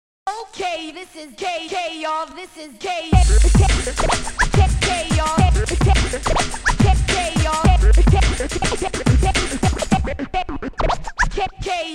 scratch beats

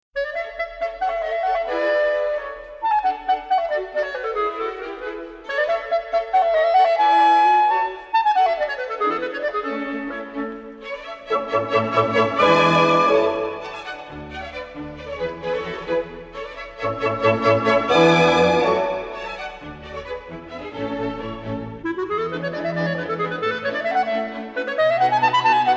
concerto for clarinet rondo allegro

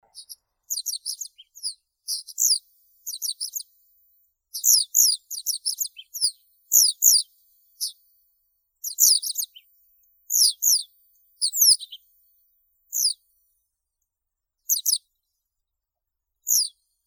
tryasoguzka yaponskaya motacilla grandis

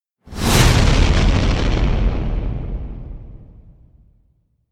destruction slams impact blast with whoosh

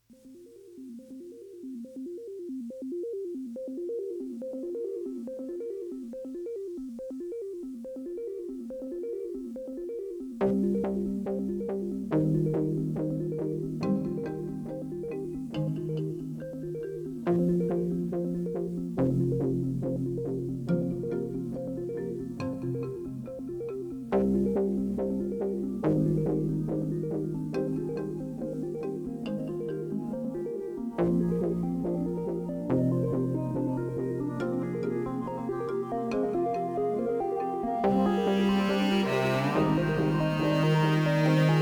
brassy sunrise symphonic version

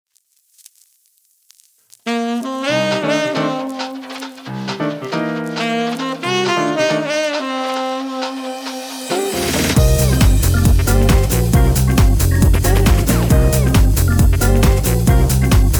easy saxophone

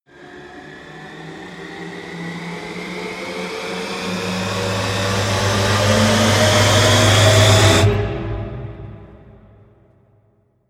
rise short; aerosol can

